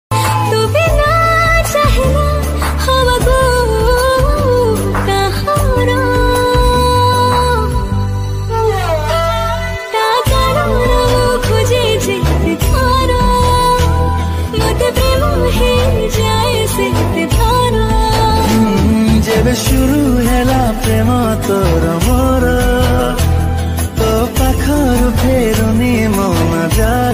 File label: Odia Ringtone